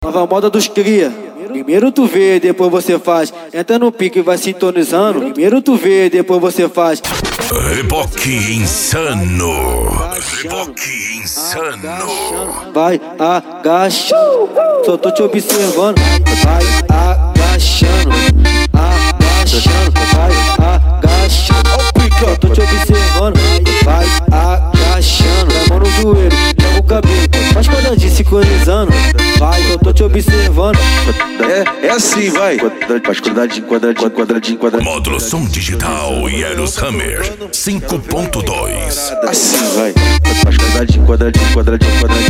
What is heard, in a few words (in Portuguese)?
noia dance e funk mega bass